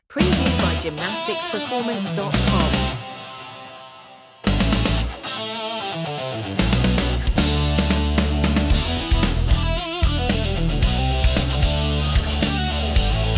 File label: classic rock